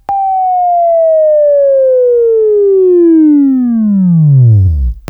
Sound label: WAV Left, Sweeping; Sweeping Training program